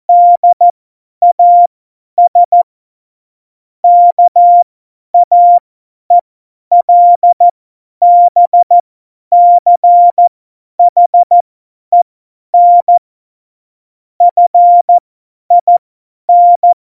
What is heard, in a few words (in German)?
Witz, Morsekurs, 50 F70; Witze